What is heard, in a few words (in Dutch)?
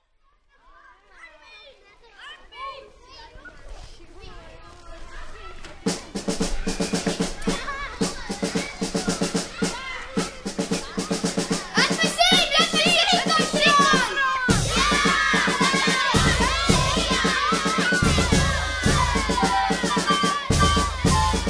de fanfare